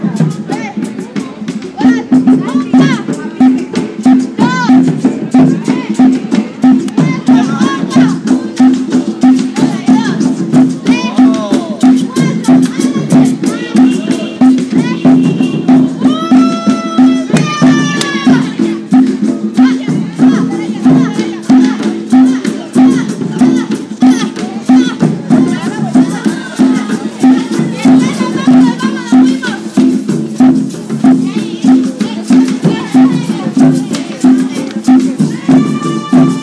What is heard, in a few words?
latin american youth dance in granville arcade